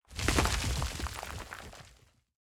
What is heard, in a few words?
rock debris; CrossCode; battle